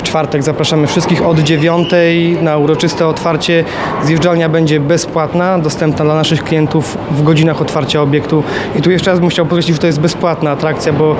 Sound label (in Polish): tuba